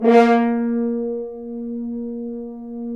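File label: brass, FHns Accent; Roland L-CD702; Accent-Swell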